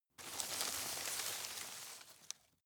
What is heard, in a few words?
drop medium